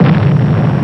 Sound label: nuke; QUAKE